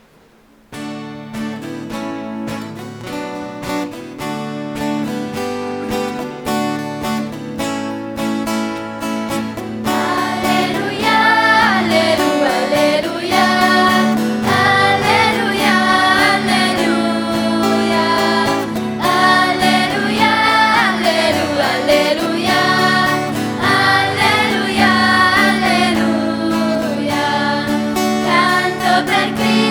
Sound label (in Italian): alleluia canto per cristo; Taizè